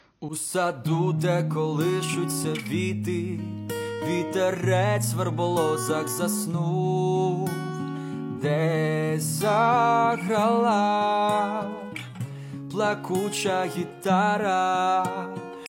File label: Plakucha gitara